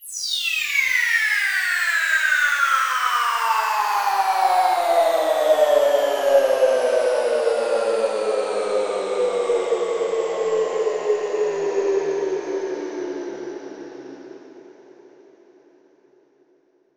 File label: Downlifter